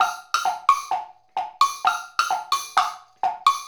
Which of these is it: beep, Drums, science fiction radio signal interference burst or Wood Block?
Wood Block